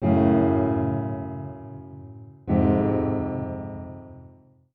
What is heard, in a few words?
Tension Piano; Mob of The Dead